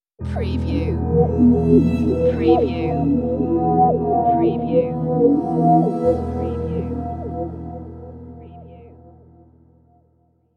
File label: Scifi Audio Logo Ident, Strange, Scattered Lights